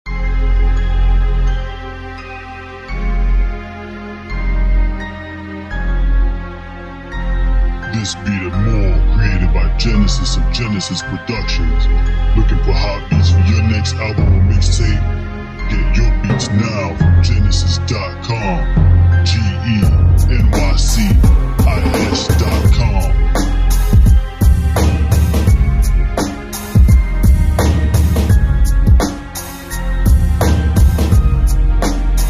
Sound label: Drums of Thunder